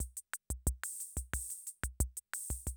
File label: CR78, DrumLoops